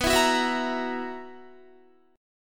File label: B Major 7th Suspended 4th, Guitar; strummed; JGuitar